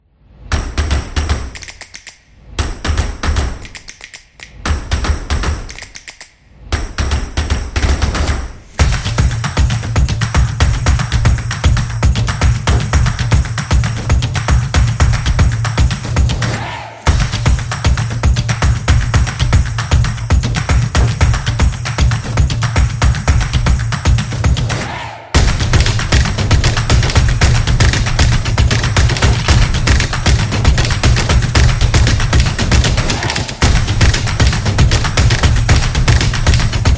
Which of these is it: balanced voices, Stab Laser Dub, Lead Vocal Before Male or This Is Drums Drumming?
This Is Drums Drumming